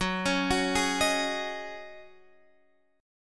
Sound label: G sharp 7th Suspended 4th F sharp